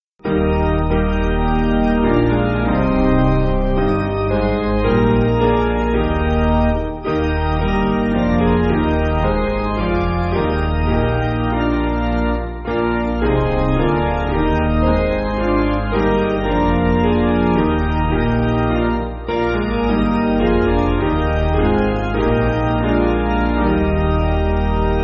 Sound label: Piano